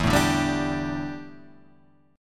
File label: G Suspended 2nd E, Guitar; Gsus2/E strummed; JGuitar